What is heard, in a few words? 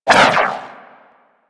Universe Ring Bounce; Warframe; Warframes; Nezha